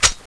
clip in; tfa cso; ultimax100

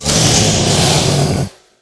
worm ouch, giant worm

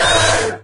pain, imp; doom3

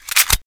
clip in, colt1911

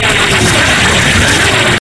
artillery projectile, weapons; bf2

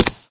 footstep, wraith; doom3

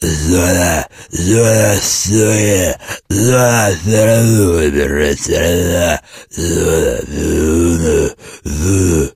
zombie idle

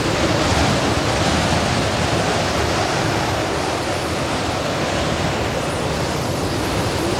wind body loop

